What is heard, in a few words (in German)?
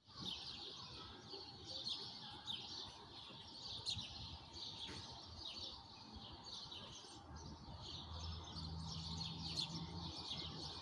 Schlangbader Straße Spielplatz; plattenbau